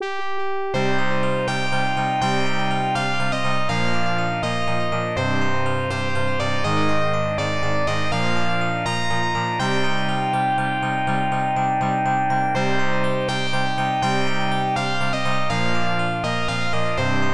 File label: Funeral March, Game Over; herzog sharp x1